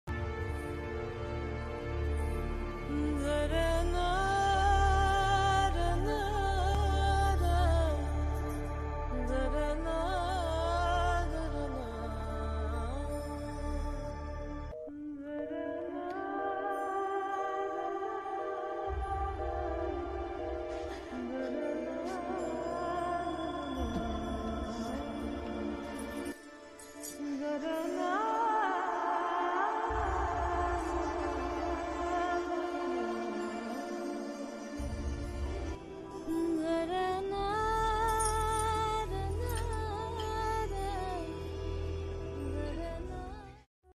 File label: alaap background score; gangubai kathiawadi; ganga's theme; alia bhatt